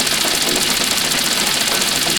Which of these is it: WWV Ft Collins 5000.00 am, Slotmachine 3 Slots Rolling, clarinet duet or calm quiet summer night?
Slotmachine 3 Slots Rolling